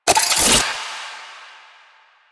Reload; Warframe; Akarius Prime

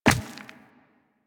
Walk Rock, footsteps; Warframe; Operator

